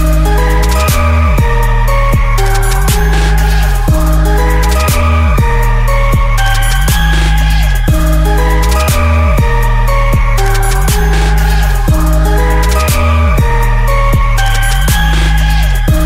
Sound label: TRAP REMIX